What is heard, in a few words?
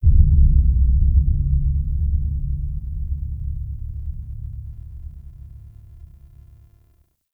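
Deep Rumble low frequency effects; Warframe; Tau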